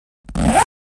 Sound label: Zipper; Household